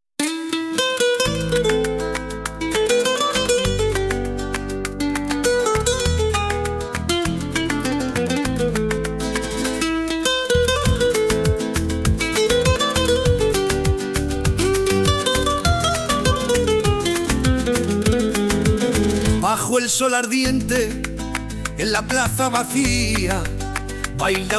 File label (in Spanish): Ritmo de guitarras, flaming strings